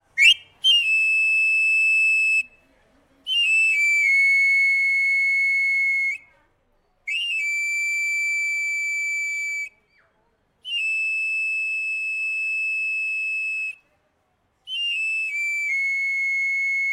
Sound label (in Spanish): AFILADOR DE CUCHILLOS SILBATO